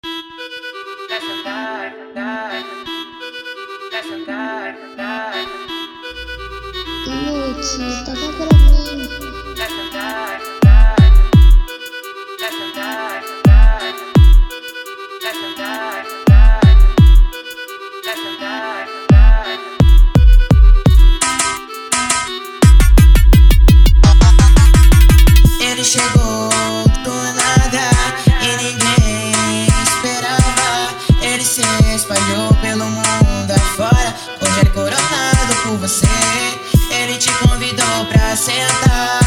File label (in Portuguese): brega funk